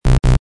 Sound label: wrong